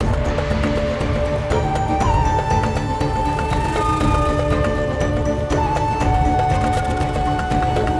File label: max win loop; amazon-city-of-gold